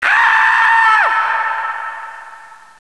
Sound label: scream